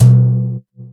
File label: Yamaha CS6